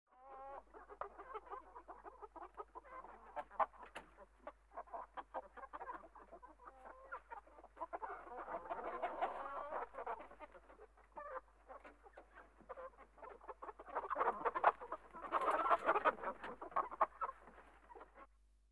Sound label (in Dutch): Kip Kippenhok (rustig); KMP Film Video Sound Effects